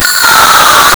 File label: wraith damage sound ether, ghost